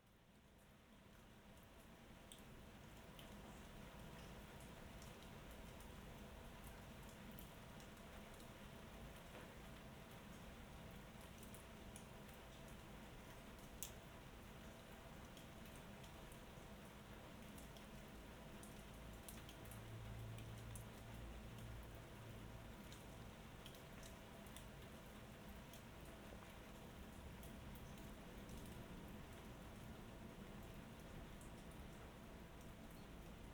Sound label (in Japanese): 山の中を歩く